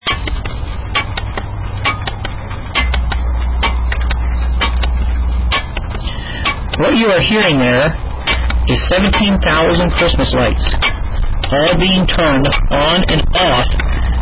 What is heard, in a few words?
x10 sounds